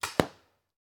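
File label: Paintball Gun Impact; Sound Ideas; Guns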